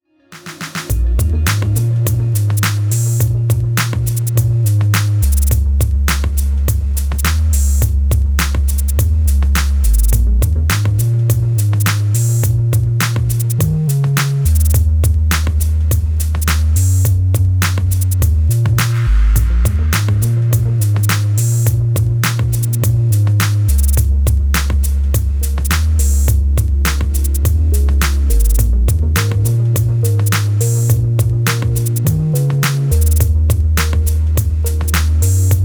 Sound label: Overdrive